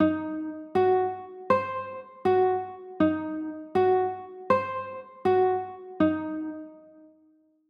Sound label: a dim7, chords